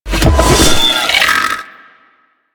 Dive Bomb Attack; Enemies; Abilities; Sentient Brachiolyst

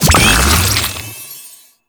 Mushroom Patch Pickup; Warframe; Nokko Colony; Vendors

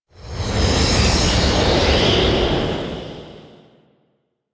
Grapple Flight Whoosh; Warframe; Teshin; Weapons

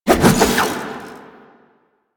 Staff Spin Swing; Warframe; Duviri; Dax; weapons

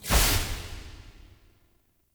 Trick Ghost Despawn Burst; Characters; Enemy; Abilities; Circade

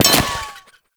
Glyph Target Hit; Duviri; Glyph Puzzle

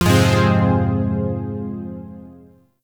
Phrygian Chord G, Zariman Shawzin; Music; Warframe

